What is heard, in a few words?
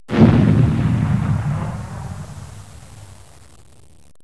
EXPL; explosion sounds